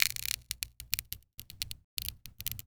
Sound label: Crash Glass Sharp Grind Glass Debris; crashes; AdobeCC